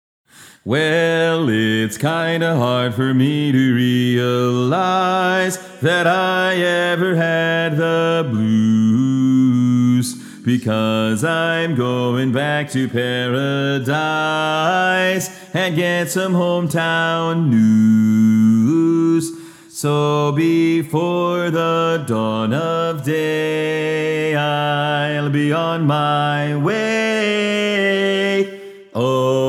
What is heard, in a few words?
Bass